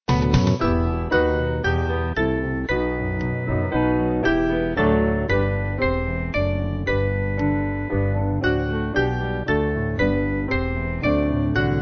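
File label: Band, Free Music Backing Files for Churches; Leoni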